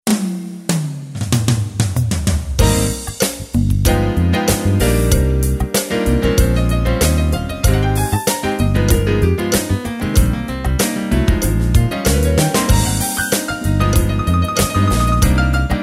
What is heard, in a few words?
pop piano